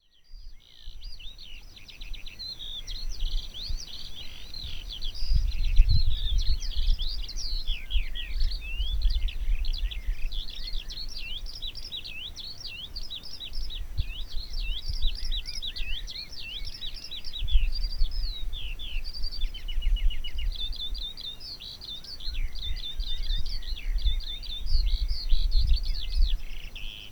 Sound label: Alauda arvensis